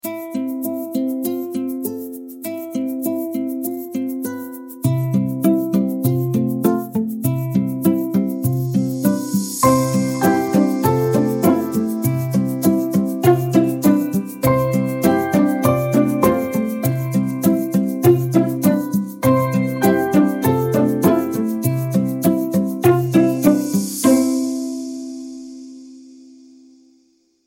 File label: bright folk melody